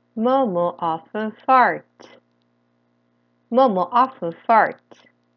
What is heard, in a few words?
farts